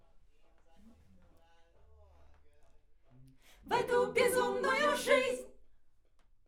Backs AKG LEFT

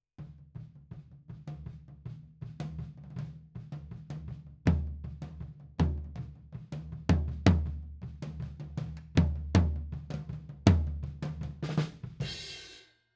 Tom, 160 BPM

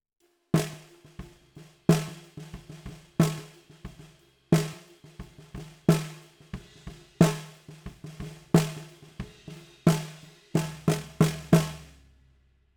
SNR B

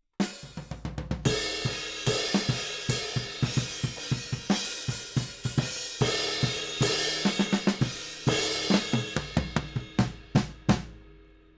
Ride

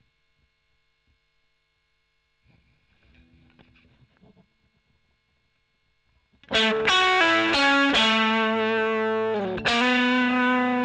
E.GTR OD; Gefell

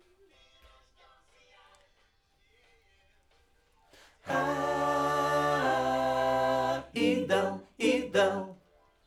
Backs AKG

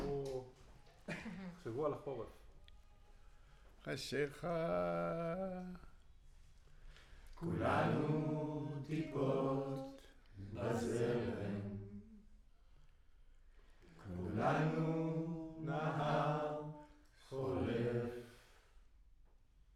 Choir

